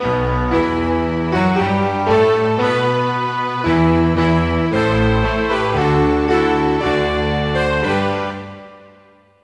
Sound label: Romania, Fanfare; 1997 MMX LAN PACK (win 95); Worms Armageddon